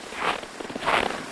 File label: Dog Sled Walk; Seer; GamigoNA